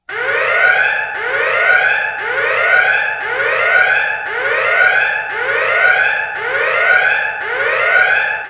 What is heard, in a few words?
alert